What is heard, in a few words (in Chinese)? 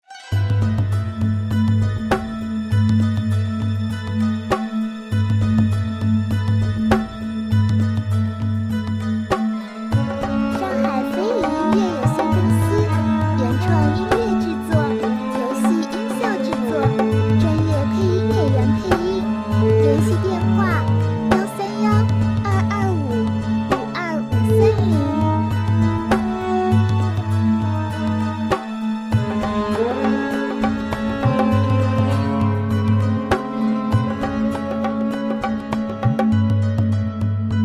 危险神秘; 山海神川鬼魅妖艳